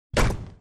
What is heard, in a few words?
brick